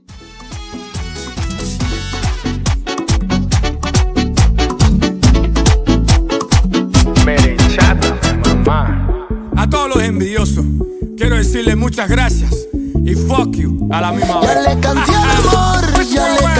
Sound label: Latin Club 140